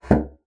bassoon slap; Xsample VOL-6; C BASSOON

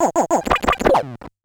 Bent Talking Teacher, Circuit Bent

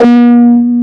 ESQ 1 FAT, SYNBASS; Synthesizers; Soli-Music; World of Synthesizers VOL-1

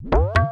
tape fx, scratch; Ambient Grooves

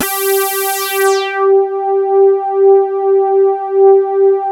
reso pad; Sound Gallery - Charged

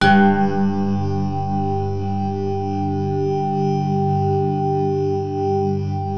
G1 BELL; NorthStar - The Wizard VOL-2; BELL DRONZE

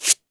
SANDPAPER

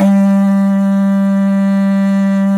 HORN, Didgeridoo and Other Primitive Instruments; Big Fish Audio; DIDGEHORN1

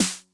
Snare Accent Mid Tuning High Tone Max Snap, 909; classic machines

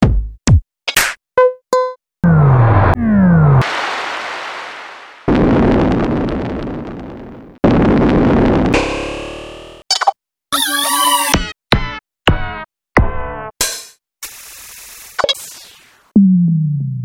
Roland D-2 Roland D2 Kit

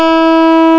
string; medusa; Amiga Soundtracker Sample Packs

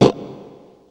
stroke, guitar; East West; Steve Stevens Guitar Samples Collection

